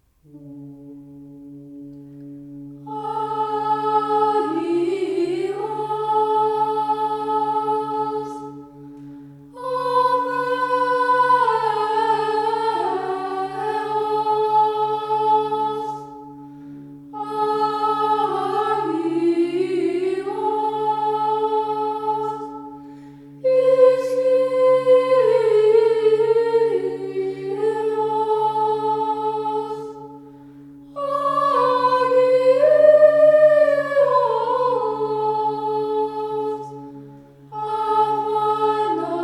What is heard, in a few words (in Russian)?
Agios (Trisvja Toe) (Na Liturgii, Grecheskoe